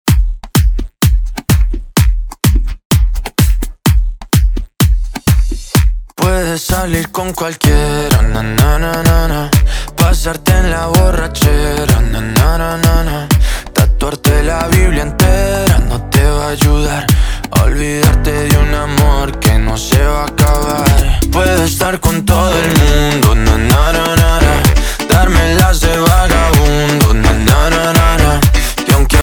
remix bpm 127

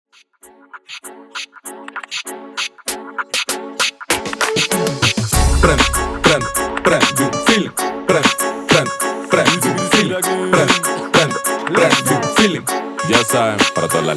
Intro Splice 98 Bpm

